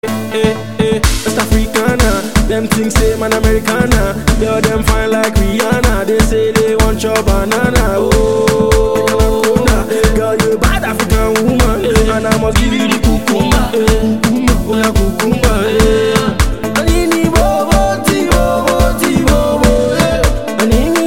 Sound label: south african ringtone